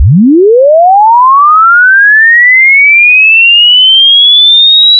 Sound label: chirp